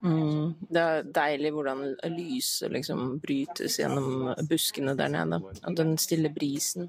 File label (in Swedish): Skrik